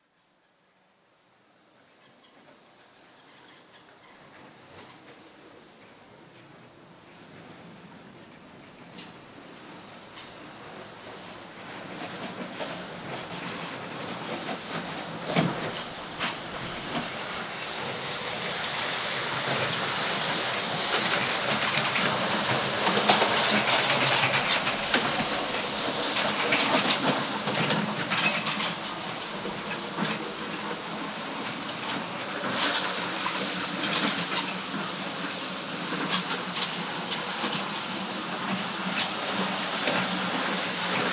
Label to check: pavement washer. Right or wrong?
Right